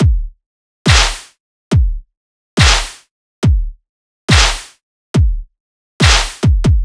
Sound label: Kick & Clap 140BPM, Dubstep; Loops; Drums; Ghosthack; Ghosthack - AC2024 - Day 13 - Dubstep Sounds 2025